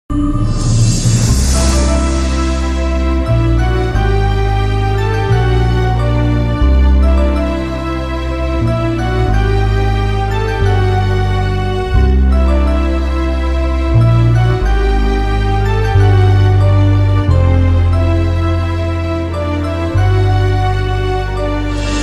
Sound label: bgm piano cover; kavin